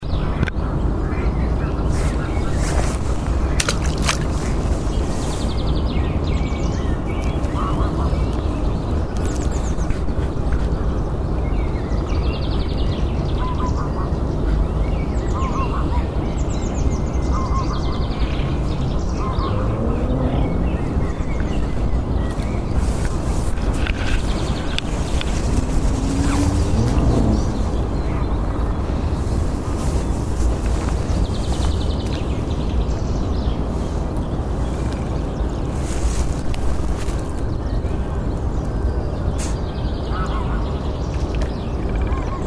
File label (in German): seegeraeusche